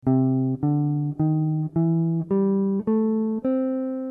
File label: major penta